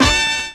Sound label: HORNHIT, HORN STAB; E-Lab; X Static Goldmine 2